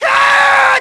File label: combat grunt; Stake Fortune Fighters; XBOX